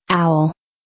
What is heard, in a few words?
owl, wild birds